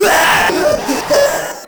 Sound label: Proto Infested Runner Player Spotted; Warframe